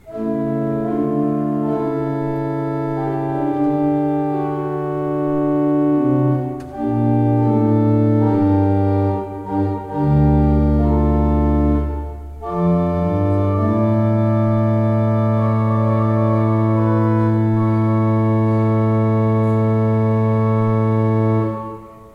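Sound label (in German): Orgel, pfeifenorgeln; Conacher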